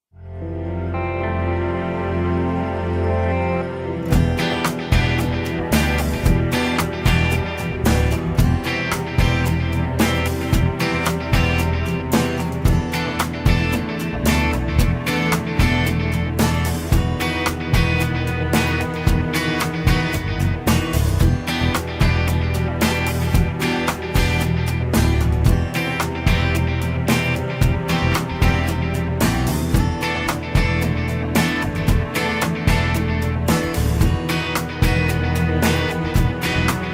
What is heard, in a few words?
Music - Dramatic Version; 2010 Recap Music